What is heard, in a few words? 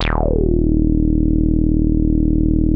WET KISS; Masterbits; Vintage Voltage; SQ+FX